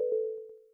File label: Swipe Failed